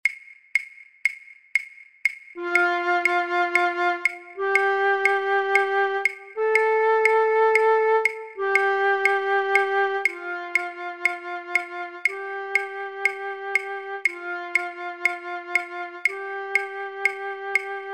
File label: flute violin 2; Regular speed; online orchestra